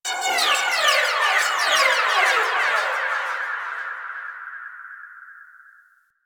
Arcade Misc; Ambience; Props; Warframe; NineteenNinetyNine

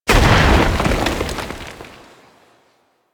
Excavation Scanner Deploy Impact; Missions

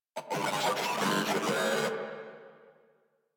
Moa Vocal Attack; Warframe

